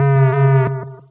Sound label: damaged